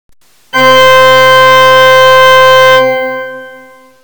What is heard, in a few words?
church organ, tremolo